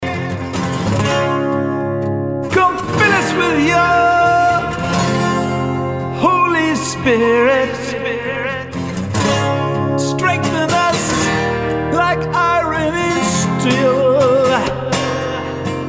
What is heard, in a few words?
iron and steel acoustic